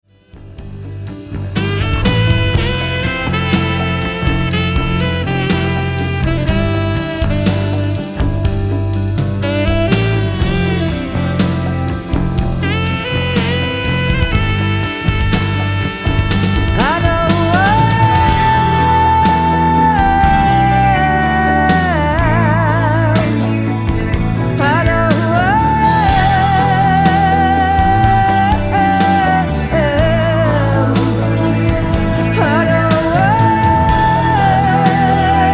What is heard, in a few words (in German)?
pop, ballade